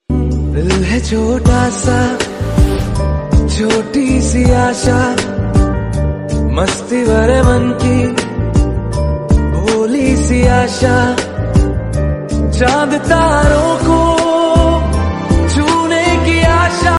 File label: ringtone music